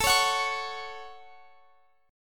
C sharp Suspended 4th Sharp 5th A, chord

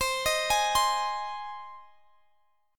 G sharp Major C

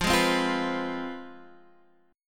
C Major 7th Suspended 4th Sharp 5th, guitar

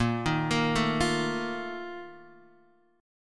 A sharp Major 7th Suspended 4th, Guitar DADGAD

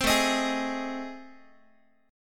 A Minor Major 7th Flat 5th, Guitar; strummed; AmM7b5; 15,17,18,18,x,x; JGuitar

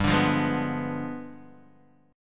G 7th Flat 9th, Guitar, Open D

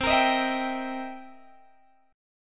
C 7th Sharp 9th C Guitar Open G

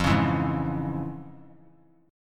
F Minor Major 7th Sharp 5th; strummed; JGuitar

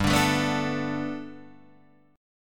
G Major Add 9th Guitar Open D, chord

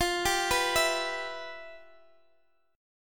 E Minor F, chord; Em/F; arpeggiated

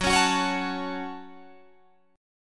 F sharp Minor, Guitar, Drop D; chordshape; down

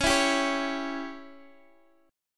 C sharp Diminished C sharp, Guitar Standard; strummed; chord; JGuitar

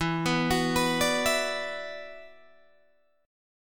E 7th Suspended 2nd, down, Guitar, Open D

